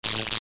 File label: Electricity zap thin crackle